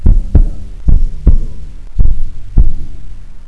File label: heartbeat death